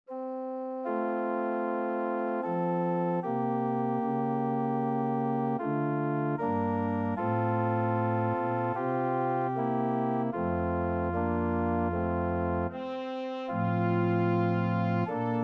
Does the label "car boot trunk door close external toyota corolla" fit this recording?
No